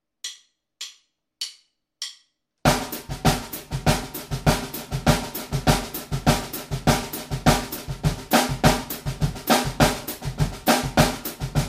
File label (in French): samba batterie accents caisse claire